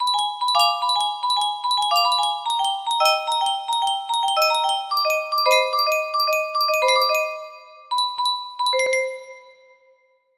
melody taps, music box melody

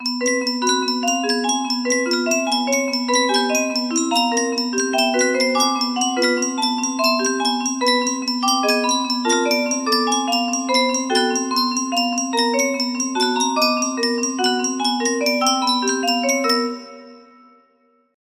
jingle, music box melody

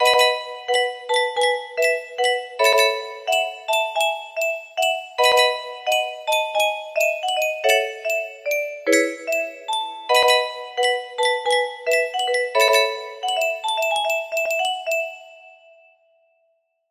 Untitled, music box melody

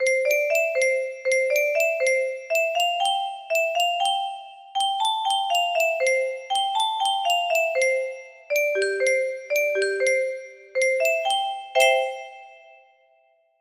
music box, melody

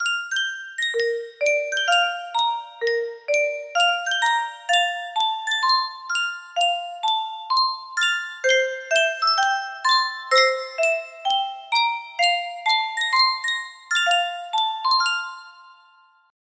Yunsheng custom tune music box

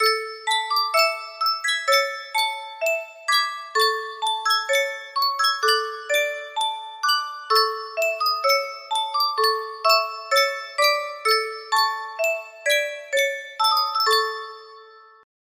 Gueissaz music box

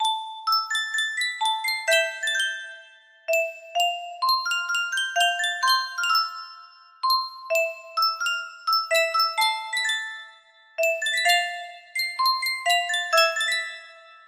sankyo music box, melody

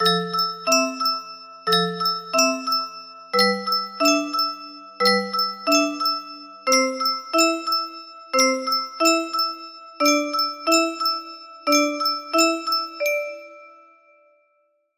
melody

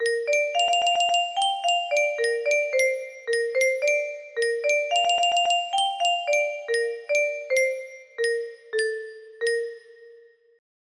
music box melody

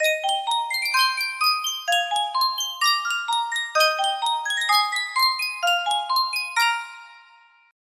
miniature music box; sankyo

